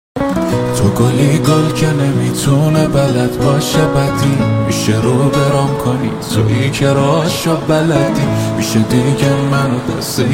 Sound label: Guitar Version